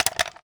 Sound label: sks mag out